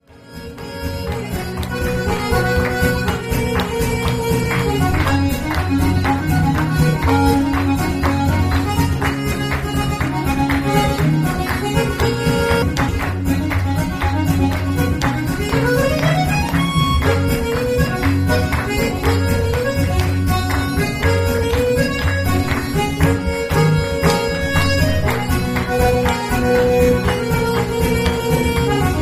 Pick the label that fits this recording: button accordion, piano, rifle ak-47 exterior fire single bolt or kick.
button accordion